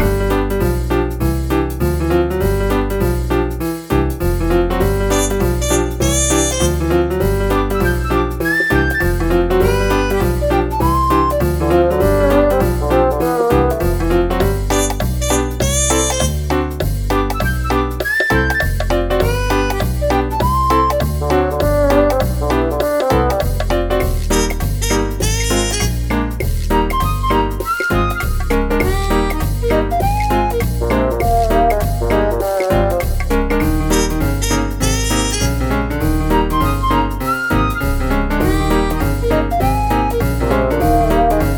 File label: gag shop